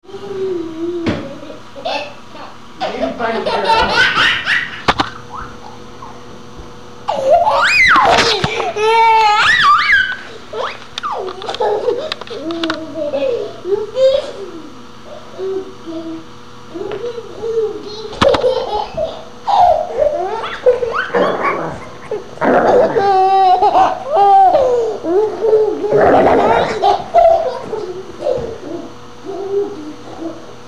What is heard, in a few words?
sweetie laughs, baby; August 5, 2001